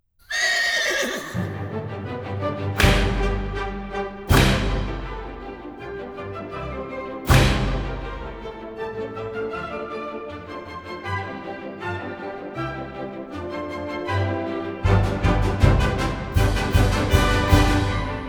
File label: Polka, for orchestra; Jockey Polka